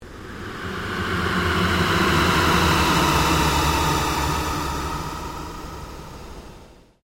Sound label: PULSE LONG SWEEP